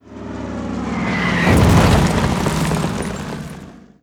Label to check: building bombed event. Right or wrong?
Right